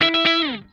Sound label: COOL OCTAVE, Funk Guitar; Zero G - Funk Guitar